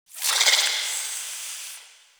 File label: Interior Hiss, Infested, Ambience; Misc Indoor